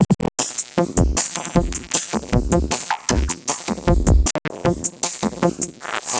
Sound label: IDM Beat 155 bpm, drums; Loops; Ableton Live 12 Lite; Core Library